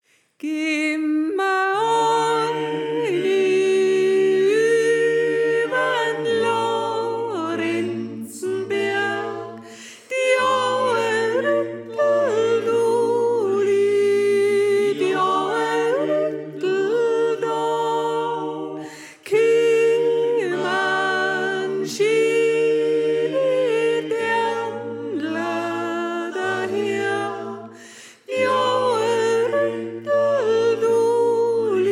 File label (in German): Sopran; Geh Ma Oine Übern Lorenznberg